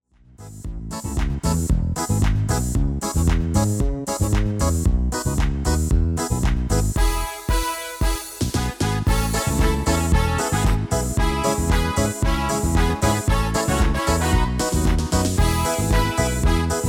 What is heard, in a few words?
MIDI